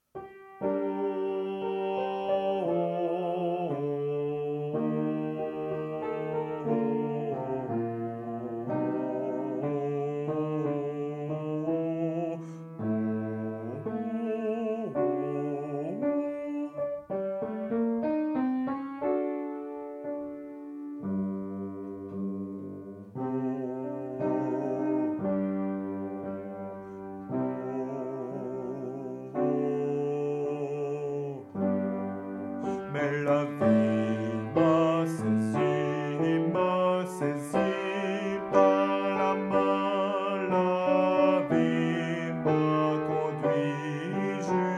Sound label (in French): images basse